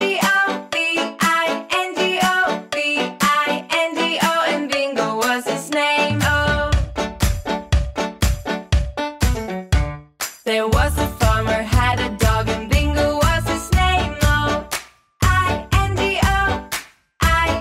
bingo cartoon tone ringtone

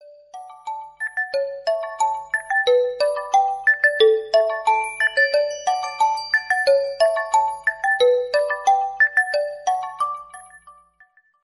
incoming lenovo ringtone

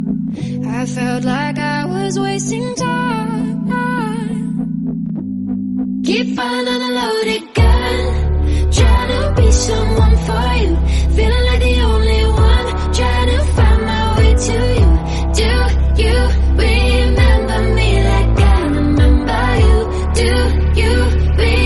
romantic song tone ringtone

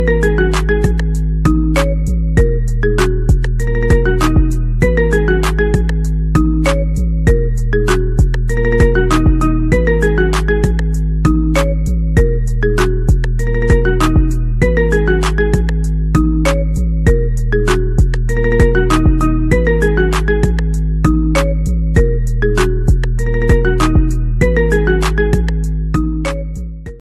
english mobile ringtone

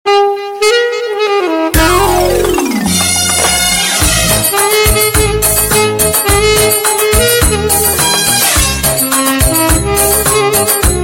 arabian instrumental

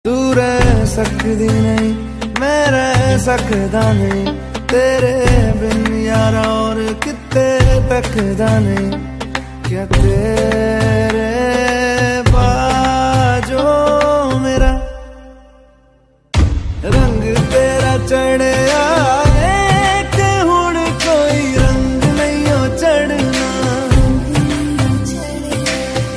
hindi ringtone

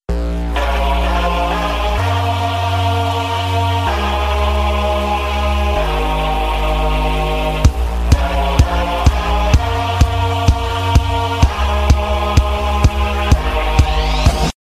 deep dance ringtone

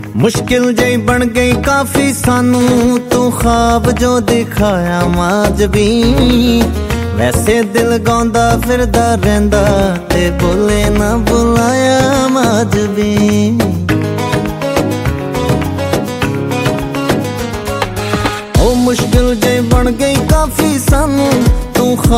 punjabi ringtone; bollywood ringtones

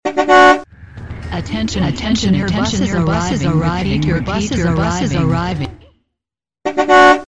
bus sms ringtone

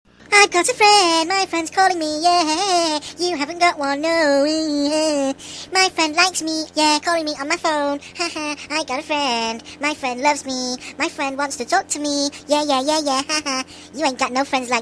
funny call tone ringtone

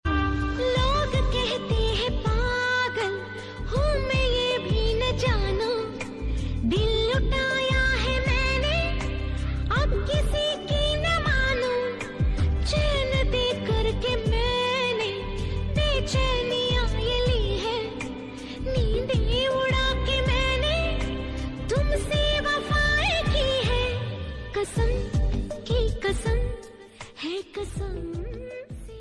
old hindi ringtone, bollywood

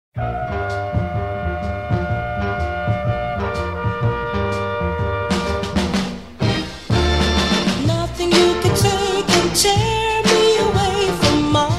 sms ringtone

